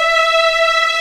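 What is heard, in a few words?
E5 legato, violins; East West; The Ultimate String Collection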